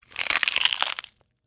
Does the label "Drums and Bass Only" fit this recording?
No